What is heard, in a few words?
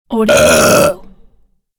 burp